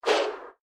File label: fire fireball; Adventure Time - Elemental; ThrillGames